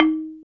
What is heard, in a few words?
KULINTANG E4; Spectrasonics; Heart of Asia; KULINGTANG